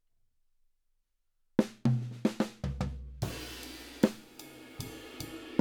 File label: Drums